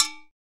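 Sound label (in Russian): вода капает в металлическую пустую бочку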